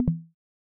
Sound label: check off; anny v1 [ML] safe